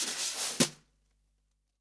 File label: snare drum A, SWING SNARE CHROMATIC, REAL DRUMS